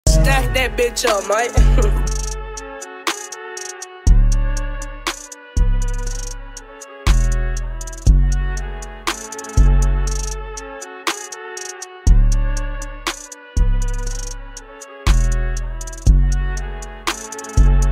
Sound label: Instrumental